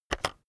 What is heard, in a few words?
keyboard external enter press fast heavy